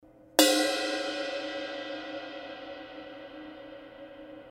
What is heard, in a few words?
20 Dark Thin Crash, cymbal sound